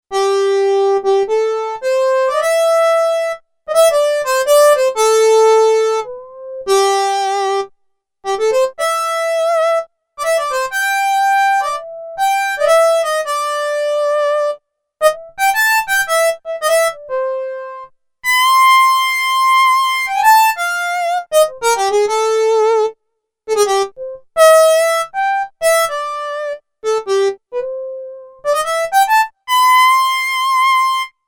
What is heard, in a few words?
HARMONICA, Korg DW-8000; Factory Patches